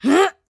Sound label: flyer attack